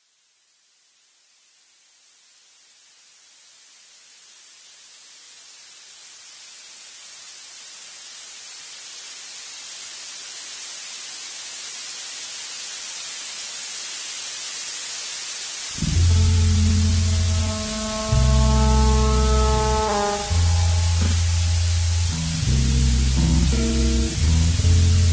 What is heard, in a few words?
Blue Noise Mix